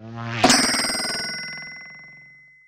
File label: fork; Crash Bang Boom